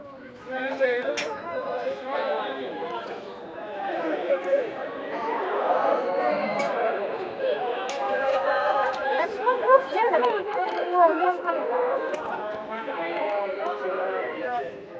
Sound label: 20 people chatting at the, wedding